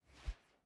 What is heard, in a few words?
Fighting Woosh; SoundsCrate